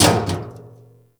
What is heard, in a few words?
Physics Barrel Impact Medium, Metal Barrel